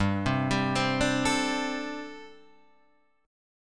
G Suspended 2nd Suspended 4th G, Guitar Half Step Down